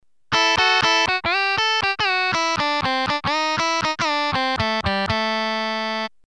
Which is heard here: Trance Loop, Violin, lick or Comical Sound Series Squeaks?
lick